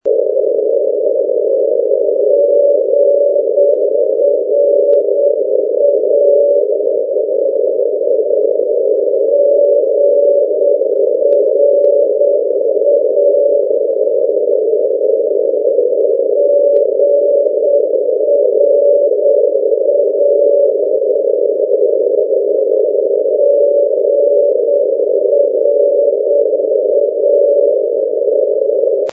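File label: ndb grazzanisa, non-directional beacon; sdr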